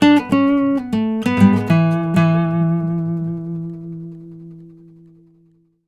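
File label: baritone lick E, Blues Guitar; ILIO; Fretworks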